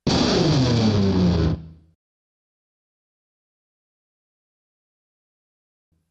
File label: Solo Guitar Riff